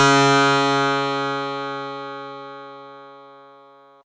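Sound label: Fuzz Clav DX100 C#1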